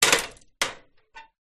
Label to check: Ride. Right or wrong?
Wrong